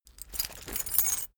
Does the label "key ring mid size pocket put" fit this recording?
Yes